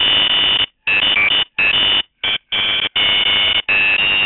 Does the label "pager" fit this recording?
Yes